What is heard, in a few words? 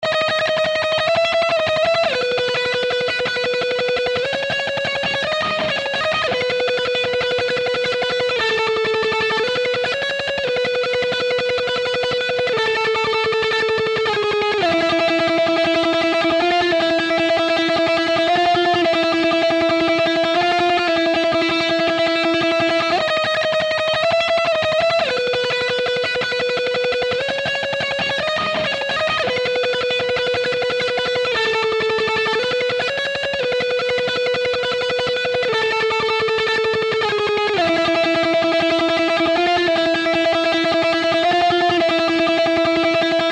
general Music rock Lead; Lucha Rumble